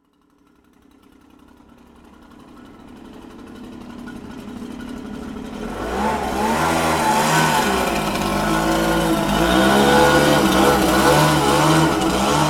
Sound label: gas powered leaf blower; Coquitlam BC, Canada